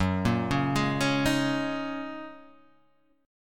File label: F sharp 6th Add 9th F sharp Guitar Half Step Down